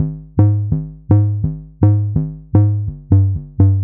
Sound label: Plink Tweaksy, Bass; Sonic Foundry (Sony Creative Software); Underground UK House & Electro